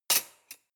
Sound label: cutlery large drawer place